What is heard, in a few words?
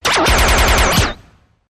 Multifire Zap